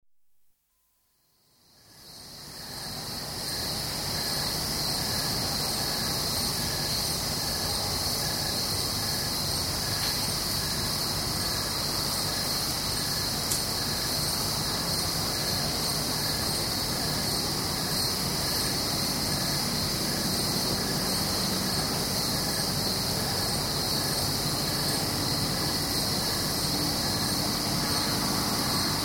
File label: New York State: Goldens Bridge, nighttime atmosphere with crickets; Water Sound Effects; BBC